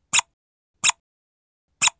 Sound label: Mult Toy Squeaks; Puppy Luv A New Breed